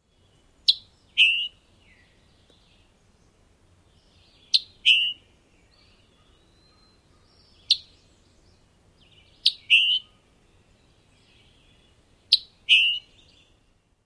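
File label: chick-bree and chick calls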